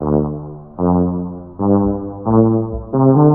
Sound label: fake oompahs, Electronic Loops